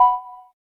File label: window state changed; Samsung Galaxy-S8; STalkback